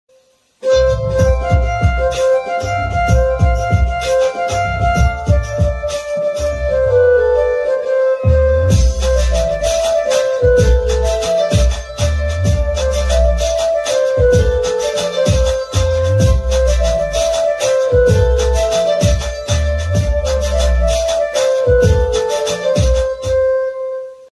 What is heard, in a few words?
song on piano new marathi song piano cover